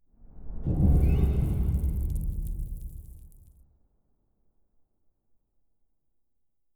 Music Puzzle Pieces Pick Up; adams venture origins

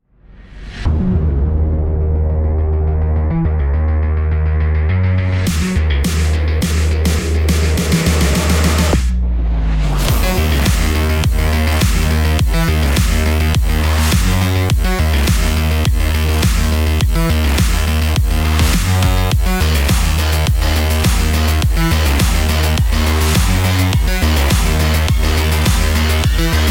Aggressive Sport Electro; Road Redemption

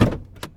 Gearshifting; gamerip; Car Simulator 2; android

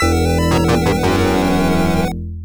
Jingle [Curse Jingle]; Osorubeki Noroi; Shining Force Genesis

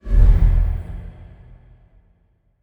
shadow teleport

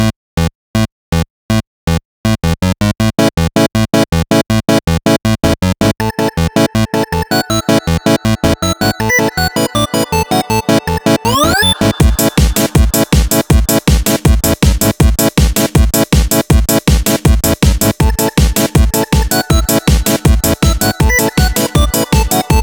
Victory 8bit

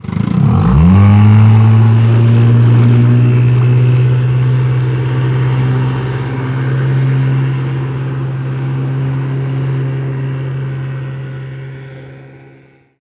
Boat; Estate; Getting The Hell Out

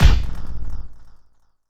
Dive Kick Land

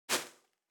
snake pain; En kasket snake pain3; Fight Knight

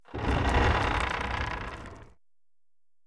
treasure chest; adams venture origins

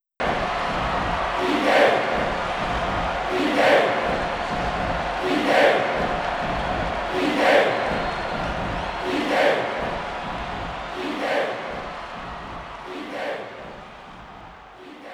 Crowd Chant; mario strikers charged football wii gamerip 2007; Donkey Kong

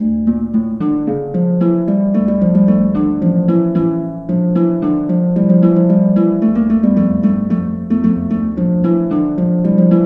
Harp; Rhyme of Duotime; The Bard's Tale Remastered Trilogy

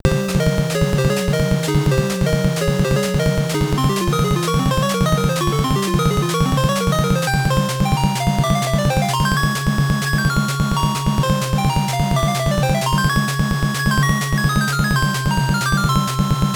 Trainer Battle; pokemon pocket monsters nes bootleg henggedianzi jncota

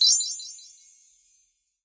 UI STAR; Dino Shift 2

